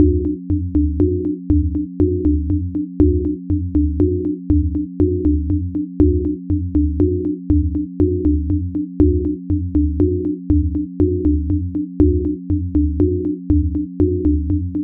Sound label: Holographic Orchestra Rehearsal Tones